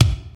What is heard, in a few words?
old school rich top end kickdrum g key